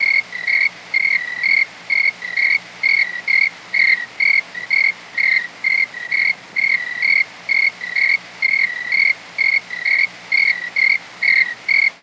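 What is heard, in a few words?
Crickets Close Up Single Chirping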